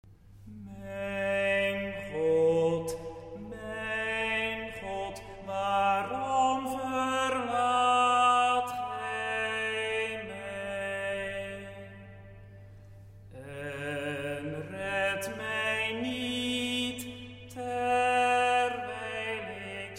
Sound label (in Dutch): Psalm vocaal